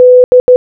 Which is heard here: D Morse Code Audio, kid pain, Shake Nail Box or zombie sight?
D Morse Code Audio